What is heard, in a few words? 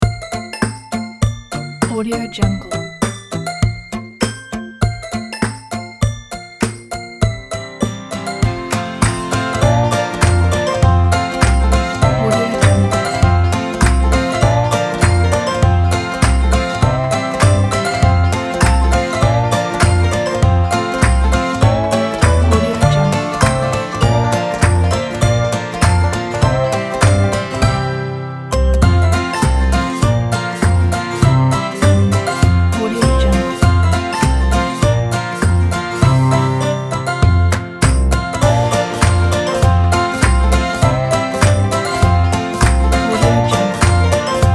childrens music pack